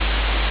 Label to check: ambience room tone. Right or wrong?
Right